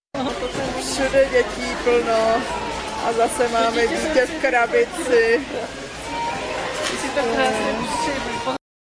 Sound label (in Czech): Quito trh deti; Video Ekvador